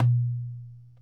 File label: Dumbek, World Percussion One Shots; Sony CS